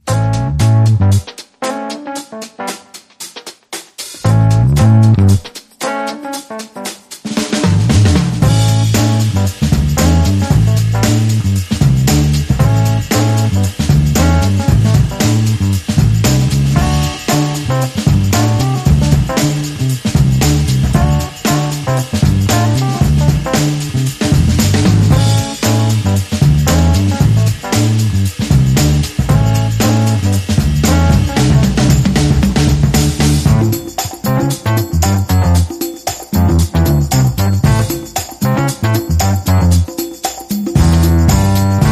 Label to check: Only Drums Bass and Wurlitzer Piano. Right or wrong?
Right